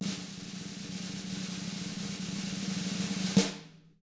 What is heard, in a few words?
snare roll; ILIO; Double Platinum Drums 1; SNARE ROLLS